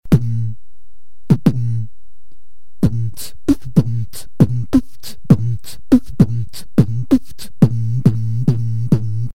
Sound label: kick; basekick